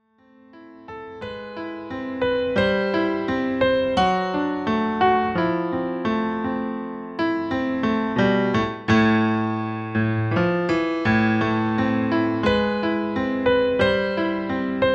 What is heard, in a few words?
pop in the 70s 80s